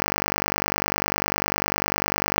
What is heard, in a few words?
125bpm 5-4 1bar; MidroSync